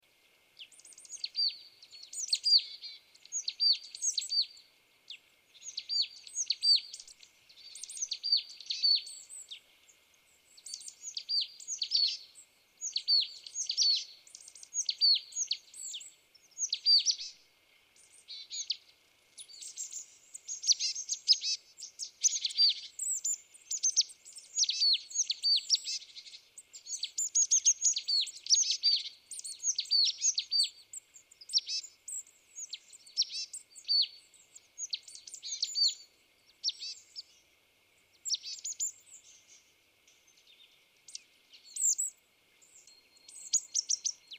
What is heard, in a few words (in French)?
mesange nonnette, chant